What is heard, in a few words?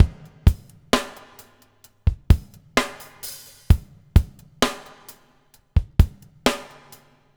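BUBBLE left; Spectrasonics - Backbeat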